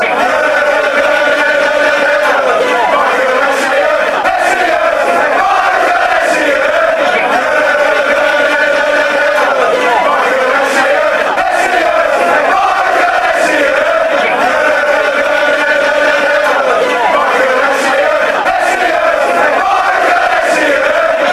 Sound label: fan chants; michael essien